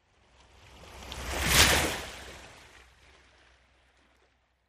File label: Ocean Surf Water La